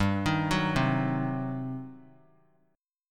G Major 7th Suspended 4th Sharp 5th, G, down; GM7sus4#5 arpeggiated

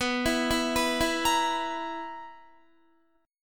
B Major 7th Suspended 4th, Guitar; arpeggiated; 18,19,16,16,16,18

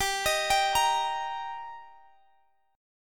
G Minor Sharp 5th G down; Gm#5 arpeggiated

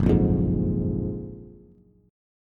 Gb Major 9th, down, Bass; GbM9; strummed; JGuitar

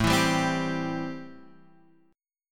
A-Minor 7th A

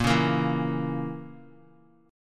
Bb Diminished Bb Guitar; strummed; JGuitar

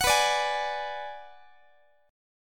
B-Minor 6th B down Guitar; Bm6 strummed

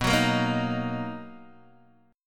B Major 7th B down Guitar Open D

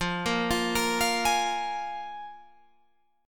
F Minor Double Flat 5th, Guitar, Open G

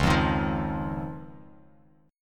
Db Major Add 9th, Guitar, Open F; strummed; JGuitar

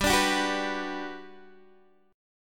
G Major 7th, G, down, Guitar, DADGAD

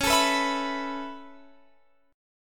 B 7th Suspended 2nd Suspended 4th C sharp

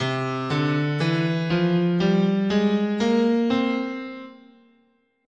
C Fifth Mode, scale

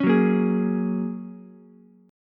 F sharp Major Add 9th, Ukulele; strummed; 6,6,6,11

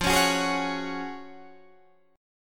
F sharp Major 7th Suspended 2nd F

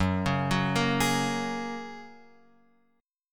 F sharp Major Add 9th, Guitar, Open F; F#add9 arpeggiated

